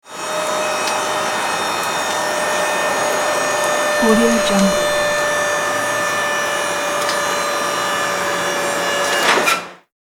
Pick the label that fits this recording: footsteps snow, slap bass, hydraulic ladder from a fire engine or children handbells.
hydraulic ladder from a fire engine